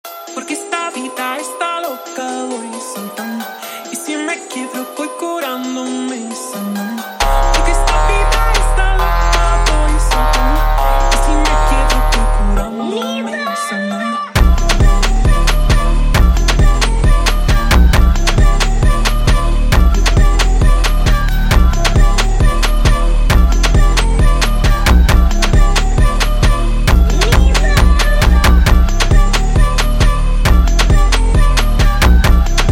Funk sped Up

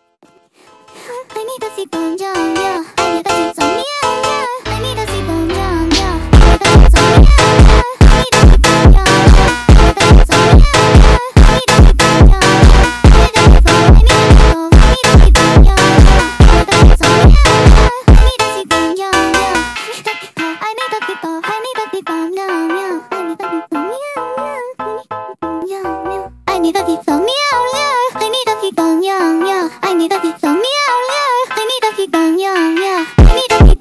Funk sped Up

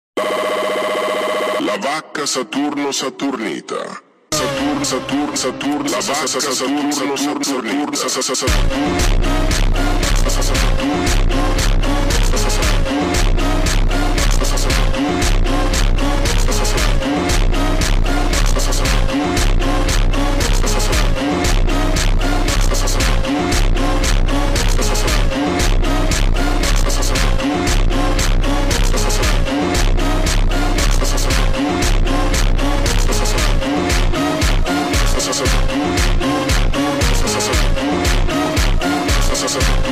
Funk slowed

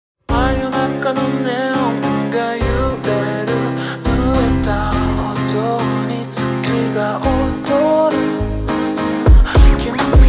Montagem super Slowed

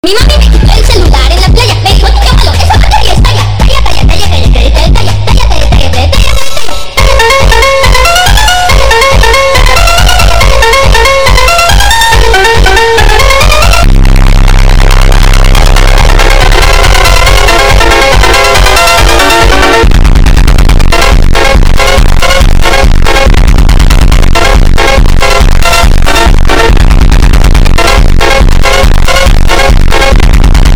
funk bassboost

